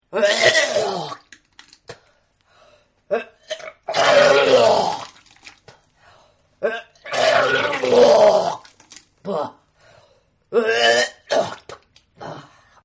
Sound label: Vomit; Vomiting; Human; Partners In Rhyme